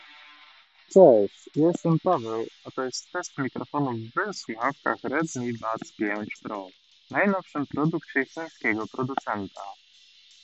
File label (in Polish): glosno; redmi buds 5 pro